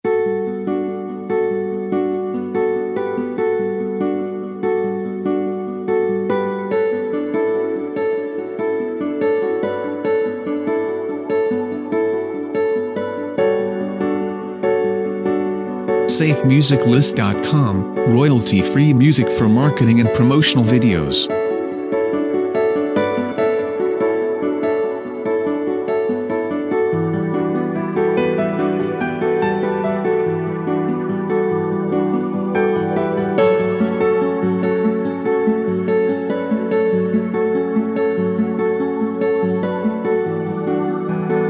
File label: Flowing Guitar Ambience; SafeMusicList